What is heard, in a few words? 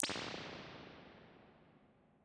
Spring Reverb